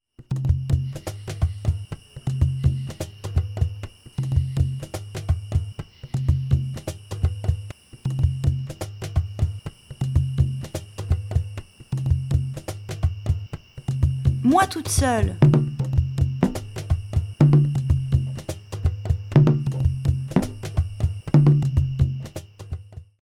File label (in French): SANS VOIX